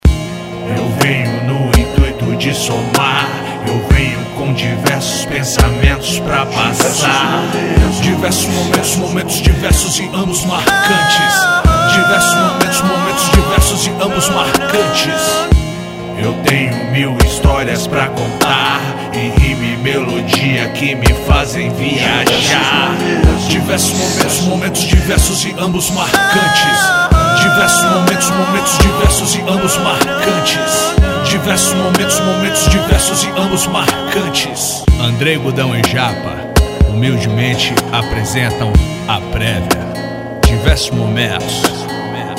vinheta

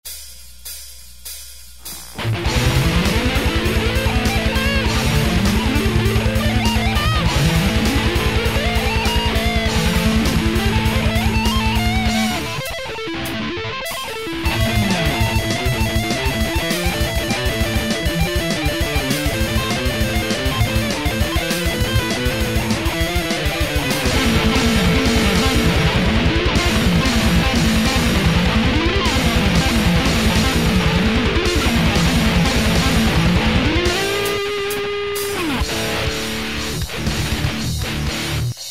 instrumental

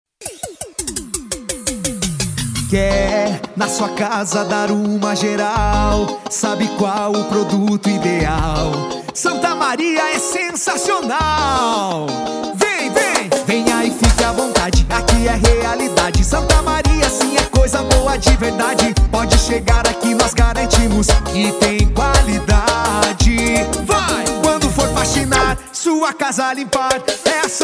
jingle comercial; industria santa maria

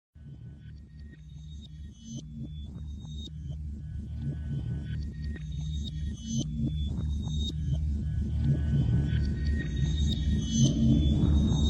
fundo musical suspense terror horror themes; musicas de terror soundtrack

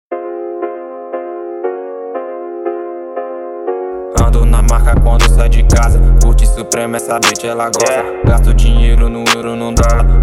beat

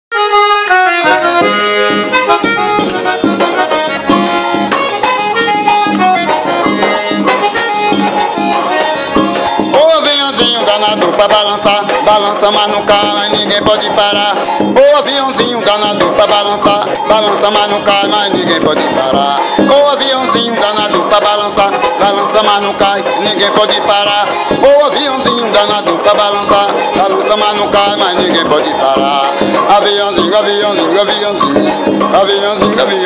voz

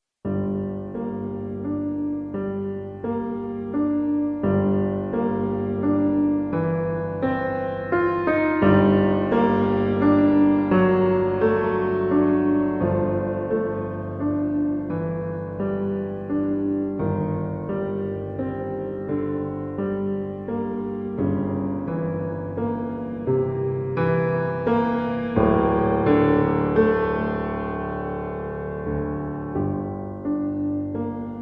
fundo musical suspense terror horror themes musicas de terror soundtrack

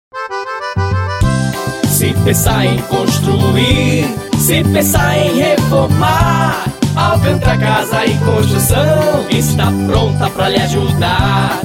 jingle; alcantra casa e construcao

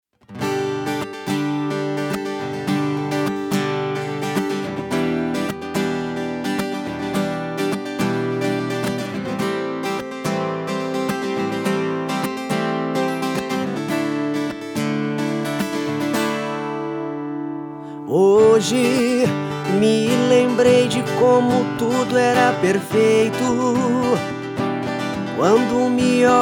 sertanejo romantica

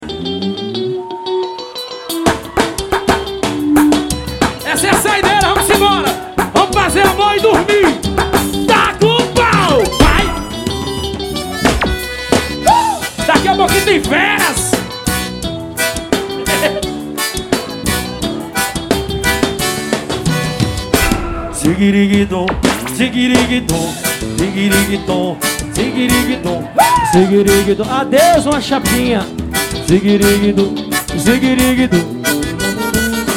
forró; manaus am

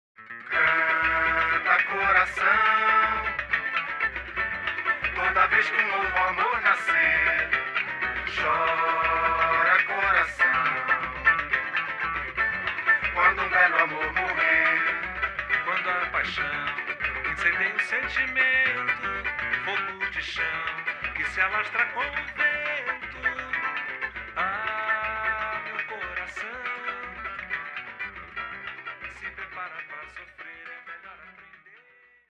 Um Samba Pro Coração

